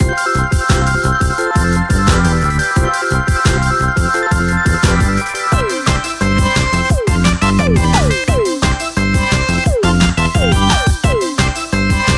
Bgm Main; Cookie Run

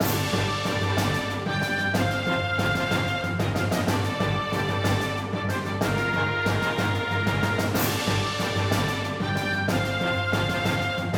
Battle Area; code shifter

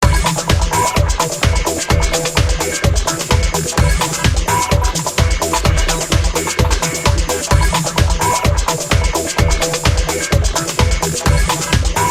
Victory Theme; Impact Asteroid Combat; Windows gamerip 2006

